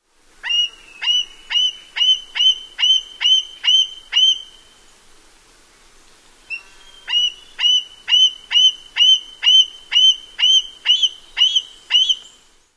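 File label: juvenile squeals, Red-tailed Hawk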